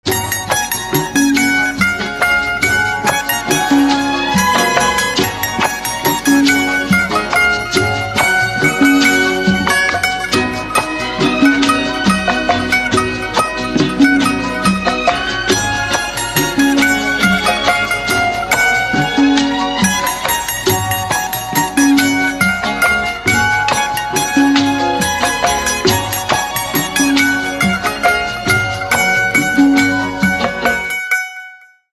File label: Sitar